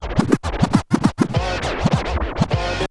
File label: TURNTABLES, Hip Hop; InVision Interactive; 40 Oz Phat Slammin